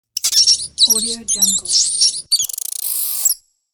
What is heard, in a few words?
rat